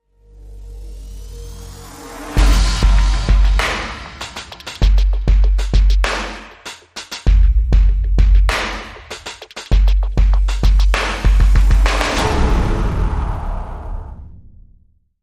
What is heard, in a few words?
Drums and SFX